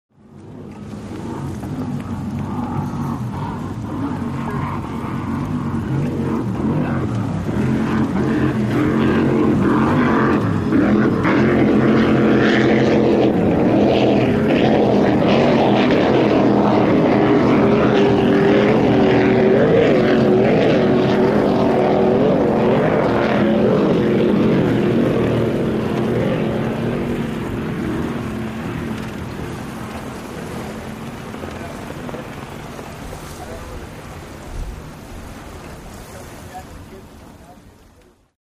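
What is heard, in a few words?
Power Boat Race Ambience, off shore power boat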